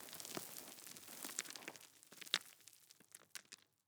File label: rockfall; Counter Strike Model Pack; CS:GO; dust2